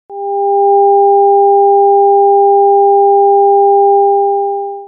2 sines

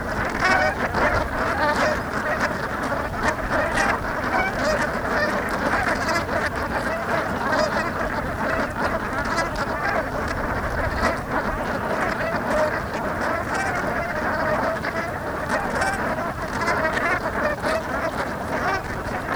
ducks

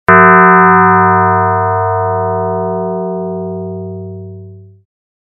bell fm

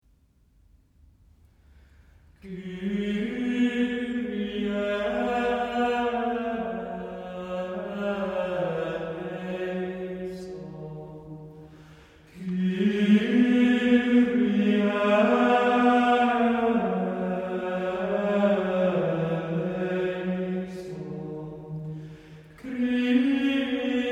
gregorian kyrie

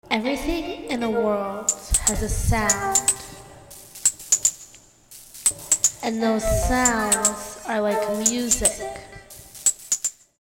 voice chorder; performing with the Voice-Chorder

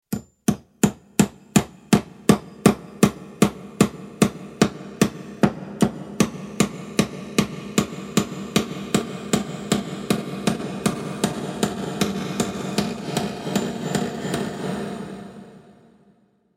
hammer verb

